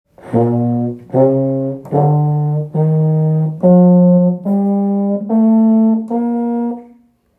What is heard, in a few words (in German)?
So klingt das Euphonium